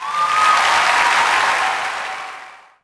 POS Reaction,Crowd; Cars; XBOX

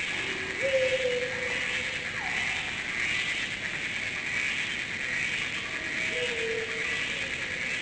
swamp; The Elder Scrolls III Morrowind GOTY; XBOX

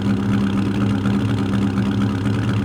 car idle; Matt Hoffman's Pro BMX 2; XBOX

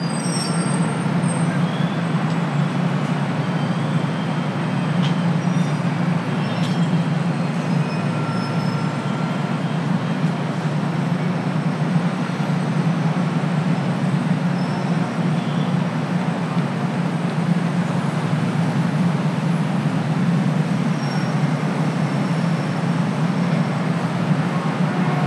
city medium, Ambient; Project Gotham Racing

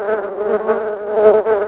in flies; The Elder Scrolls III Morrowind GOTY; XBOX

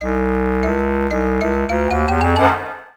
Tension

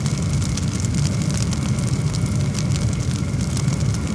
Fire Pit; Star Wars Obi Wan; XBOX

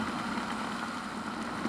water boil; Soldier Of Fortune II Double Helix; XBOX; ambience

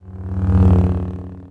lightsaber swing slow; weapons

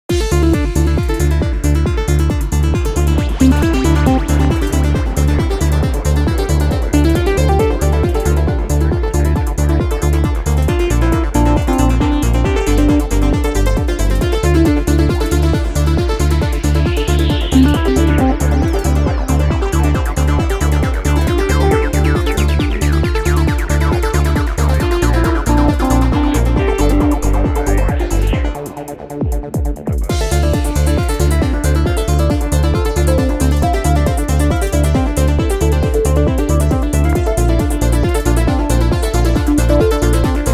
Pinball, Music; Pure Pinball

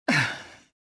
land; mothma

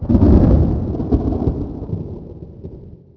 Thunder; Project Gotham Racing

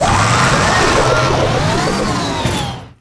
spin claw; weapons; galak

